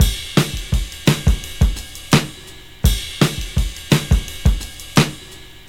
85 bpm drum loop c key, Free breakbeat - kick tuned to the C note. Loudest frequency: 1765Hz